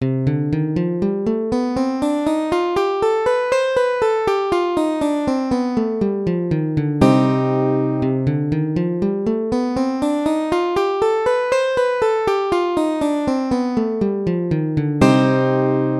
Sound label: c melodic minor scale